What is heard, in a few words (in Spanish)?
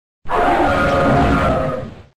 Grito de Kyurem blanco